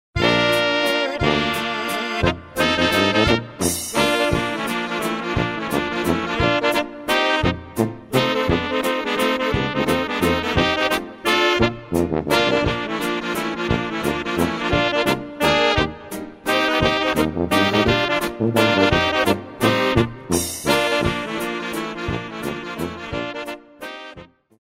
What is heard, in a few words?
sliphorn